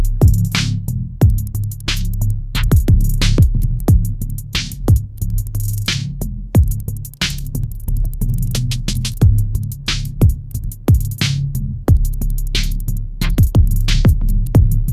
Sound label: viking war trap anthem